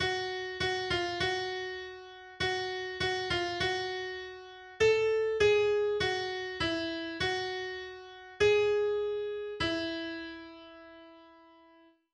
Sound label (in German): Vorübung, der Ton f# bzw. gb auf der D-Saite, Gitarre; f#/gb4; Gitarrenkurs zum Erlernen des Notenspiels auf den untersten vier Bünden